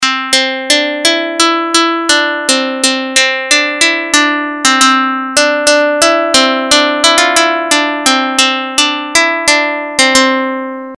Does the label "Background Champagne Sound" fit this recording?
No